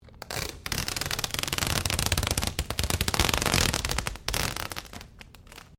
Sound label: Sticky Tape Rip; common tape; Offices